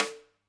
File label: Lud Rim, drum